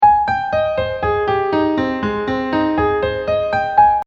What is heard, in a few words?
Minor Arpeggio Piano